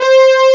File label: room brass; Epic Collection 3, The; Epic Marketing; muzak